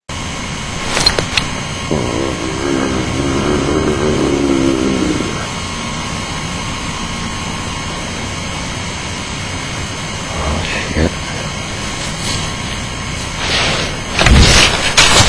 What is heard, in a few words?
Near infrasound, louder